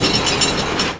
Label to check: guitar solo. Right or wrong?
Wrong